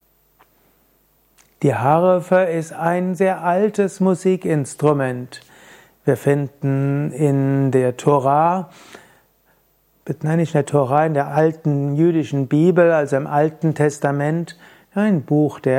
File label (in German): Harfe